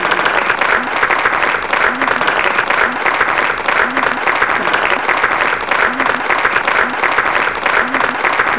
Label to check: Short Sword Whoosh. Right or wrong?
Wrong